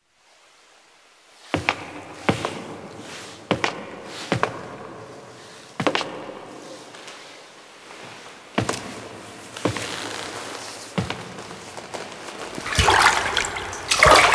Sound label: Cin Foley B; Quests; Tau; Mercy Killing Part Two